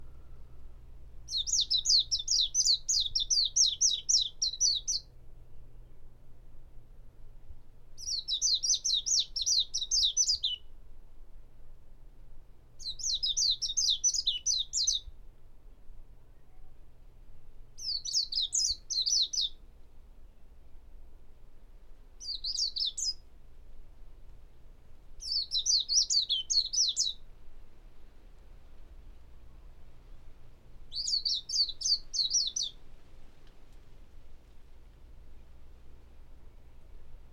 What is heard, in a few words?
Warbling White eye Song, Gageo